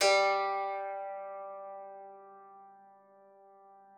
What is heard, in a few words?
klavicymb G3; Xsample VOL-8